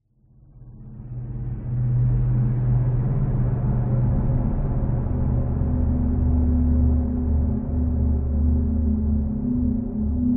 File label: horror atmosphere dark sinister evolving frightning; scott lawlor